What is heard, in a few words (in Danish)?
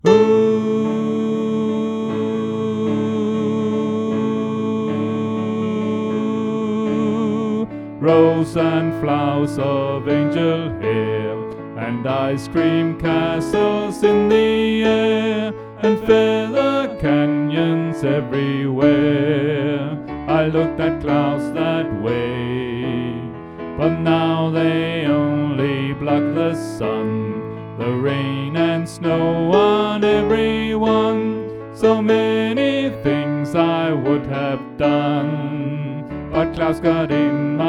Sopran; oevefiler